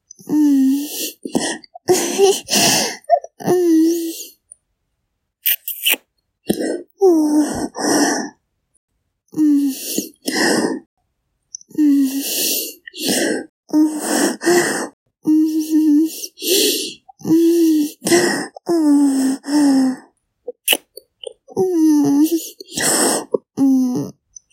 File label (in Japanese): 攻めキス, ASMR